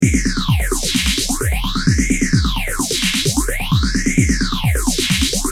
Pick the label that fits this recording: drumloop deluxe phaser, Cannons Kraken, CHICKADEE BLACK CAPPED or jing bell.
drumloop deluxe phaser